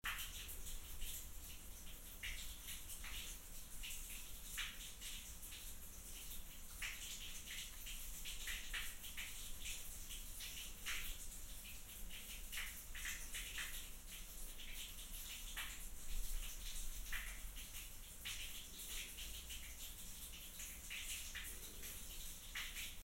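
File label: spring small brook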